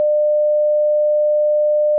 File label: sine 600 Hz